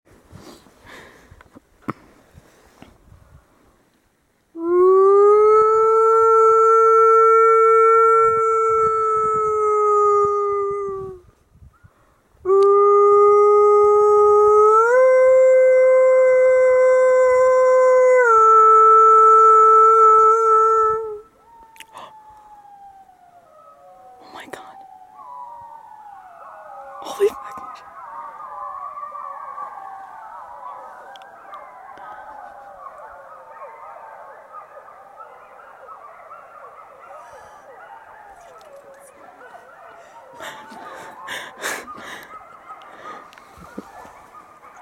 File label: wolf howls